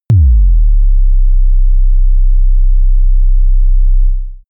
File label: sub kick sustained